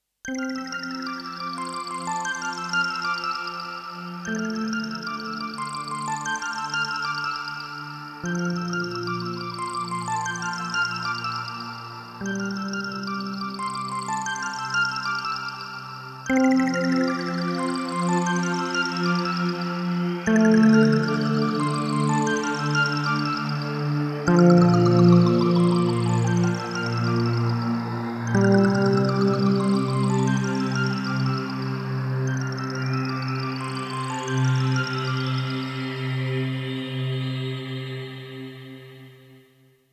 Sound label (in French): Musique; classe de neige